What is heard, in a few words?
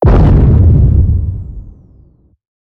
damage hits explosions; World Of Warships